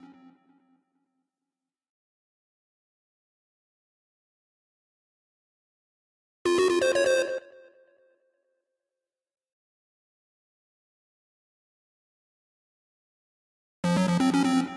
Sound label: Melody; chippy